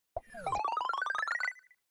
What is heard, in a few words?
score calculation; Subway Surfers